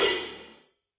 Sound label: HIHAT 14 side quarter open